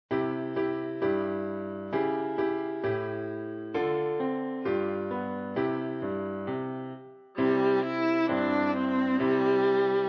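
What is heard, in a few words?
viola; Jingle Bells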